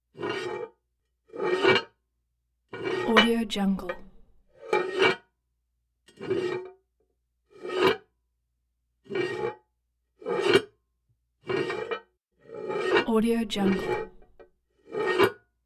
porcelain slide short isolated; prosoundfx

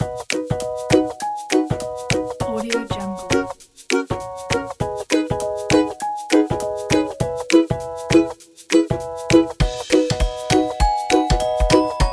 kids happy bells and ukulele

